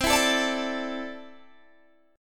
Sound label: C 6th Add 9th C down Guitar Open G